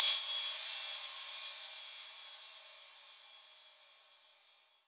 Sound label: Rides, Cymbals